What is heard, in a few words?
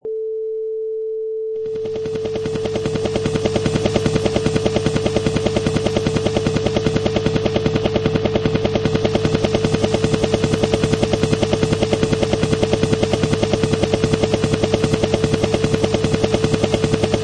Helicopter